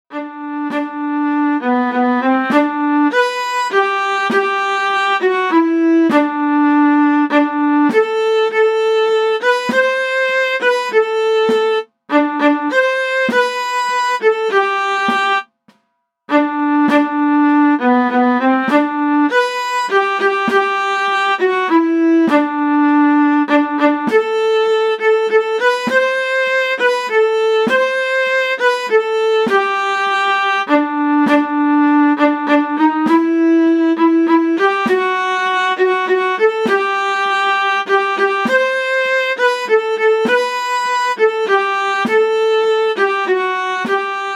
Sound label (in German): midi die hochzeitsglocken 1-stimmig